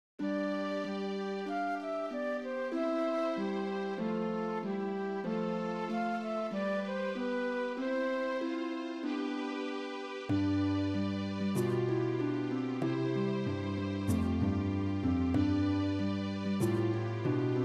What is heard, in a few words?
instrumental